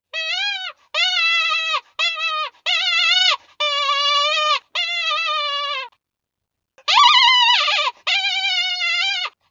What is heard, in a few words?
Predator Rabbit; Nordik